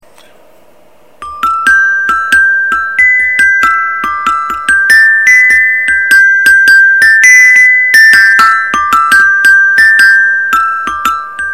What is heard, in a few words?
Music Bx; Haiku Chimes